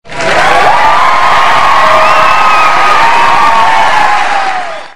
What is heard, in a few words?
cheering